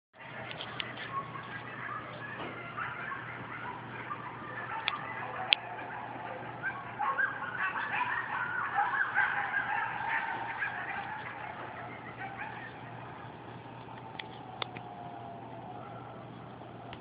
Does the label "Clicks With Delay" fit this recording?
No